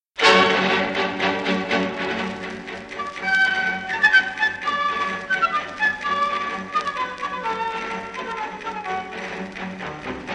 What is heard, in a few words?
Spanish Dance; TCHAIKOVSKY; SWAN LAKE